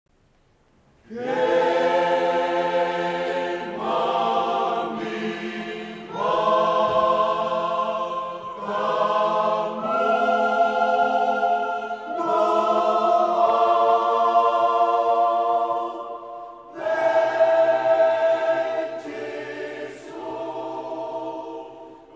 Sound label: hymn viseisei church; culture of music